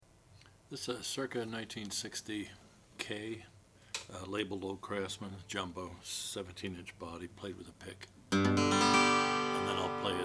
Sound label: kay old kraftsman jumbo natural